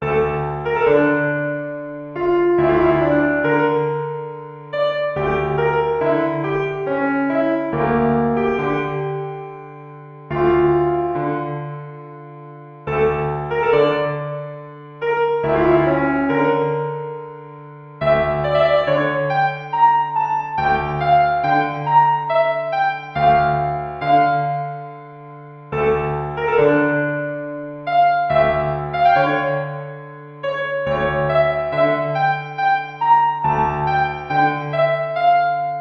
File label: nacht music